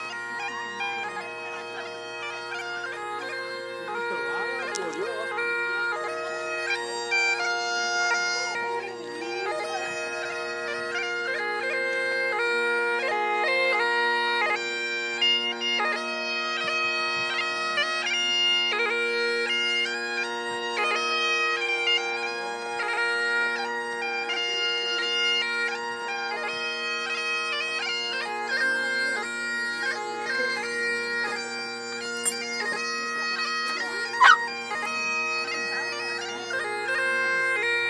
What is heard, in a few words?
bagpipes; lindisfarne